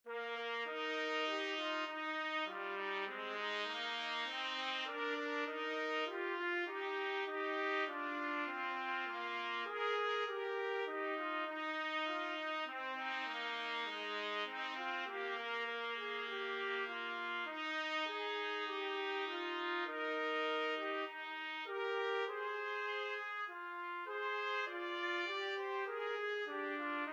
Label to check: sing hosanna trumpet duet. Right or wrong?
Right